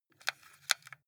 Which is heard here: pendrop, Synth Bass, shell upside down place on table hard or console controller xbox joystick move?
console controller xbox joystick move